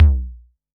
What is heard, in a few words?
Analog SY1 Tom